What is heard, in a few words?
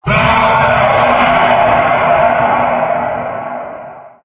male scream, halloween; Source Engine; tf